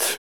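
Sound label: vox percussion, Vocal Planet Jazz FX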